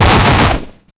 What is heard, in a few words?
old flintlock gun firing, smoky